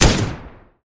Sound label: famas